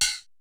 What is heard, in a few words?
ECHO KIT2; Rubber Chicken Software Sample Bank; DRUMS + PERC